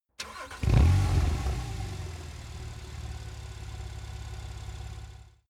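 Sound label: sports car exterior engine start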